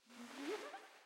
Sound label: girl Press; fairymirror